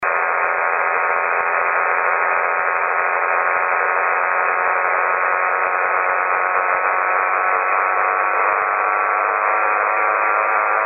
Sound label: pump